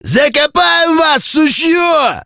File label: attack; metrocraft; customnpcs